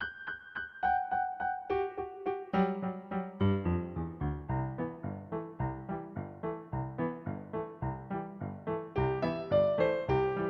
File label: duet, Jingle Bells